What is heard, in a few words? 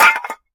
pot smash; Scrat